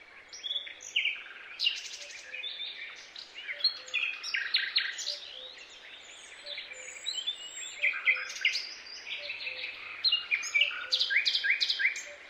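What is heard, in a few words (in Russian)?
чарующее сладкоголосое райское пение птиц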